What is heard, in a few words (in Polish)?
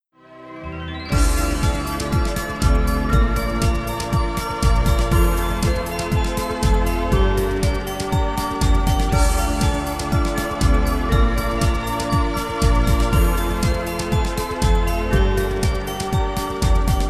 taneczny relaks, 432 hz